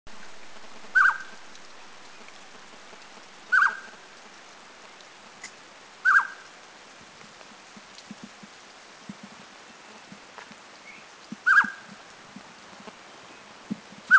Spot-breasted Scimitar Babbler; China

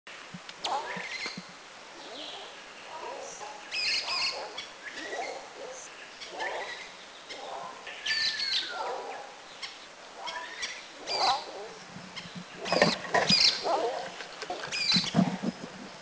Sultan Tit; Thailand

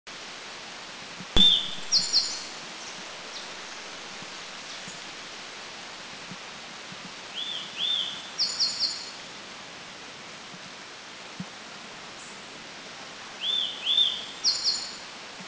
Elegant Tit, Philippines

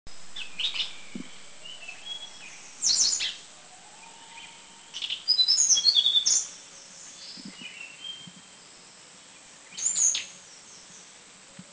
Hill Blue Flycatcher Song, Thailand

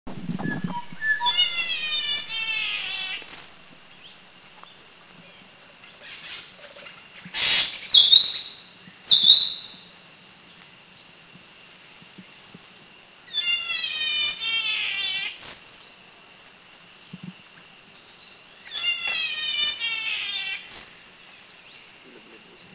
Spangled Drongo Bohol; Philippines

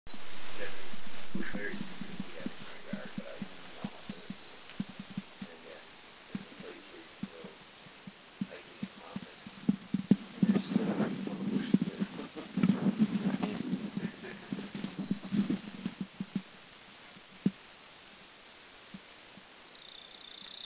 Yellowish-bellied Bush Warbler Song Anmashan; Yellowish-bellied Bush-Warbler Cettia acanthizoides concolor; Taiwan

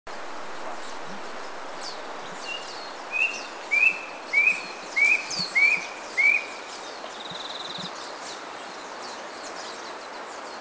Brush Cuckoo Kitanglad; Brush Cuckoo Cacomantis variolosus; Seen Kitanglad and Tabunan-Cebu, heard Bohol.

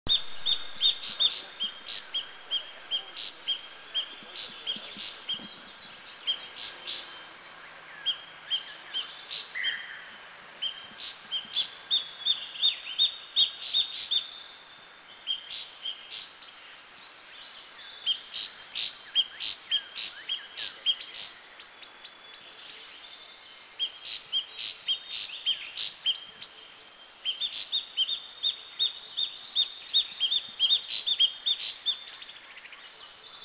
Cutia; Mishmi; India

